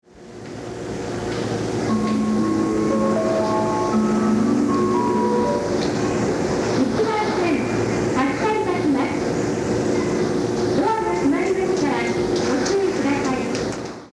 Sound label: melody, station